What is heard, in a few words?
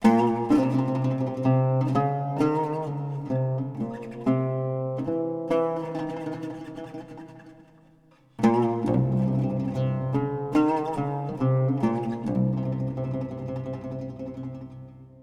oud